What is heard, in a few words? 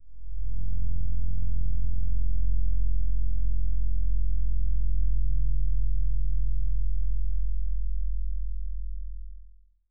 a low rumbling trembling, earth